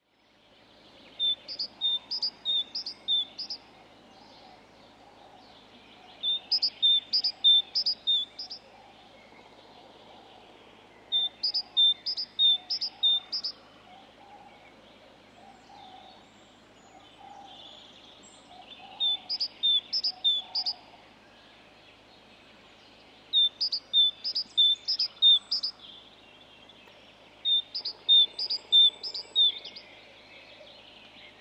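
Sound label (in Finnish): talitiainen, lintuäänet; Tavallisin laulutyyppi ”ti-tyy ti-tyy” tai yhä harvemmin kuuluva ”titi-tyy”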